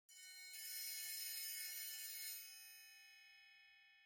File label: Triangle; Holst Jupiter